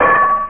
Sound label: Hit